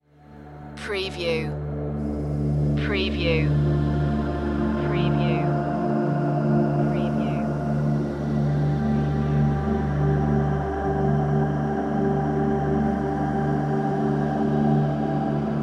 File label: haunted